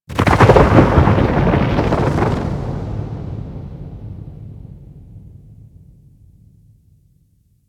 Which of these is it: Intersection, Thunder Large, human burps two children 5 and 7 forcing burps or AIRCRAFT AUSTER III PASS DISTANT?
Thunder Large